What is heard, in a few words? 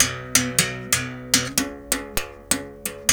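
79 bass berimbau phrase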